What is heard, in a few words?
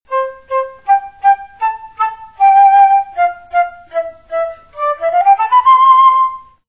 flute twinkle